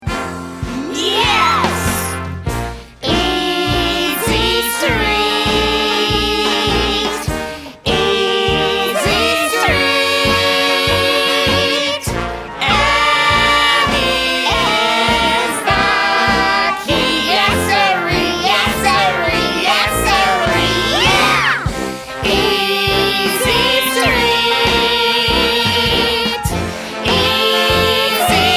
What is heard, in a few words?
easy street w vox